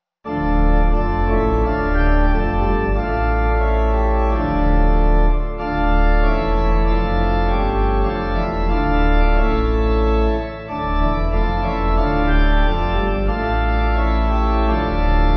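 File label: Watchman Tell Us, Pipe Organ; Aberystwyth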